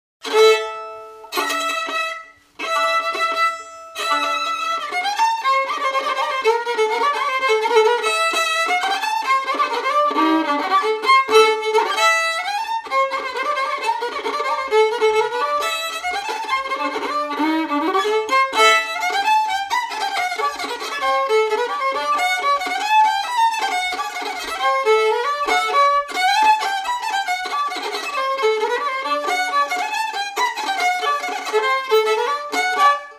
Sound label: Polka; Shelest